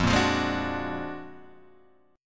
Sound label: Gb Augmented Major 9th, Guitar